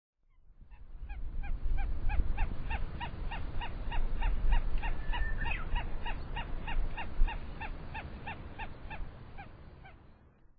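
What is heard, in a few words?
Barred Buttonquail; mifuuzura; kiyamu; koe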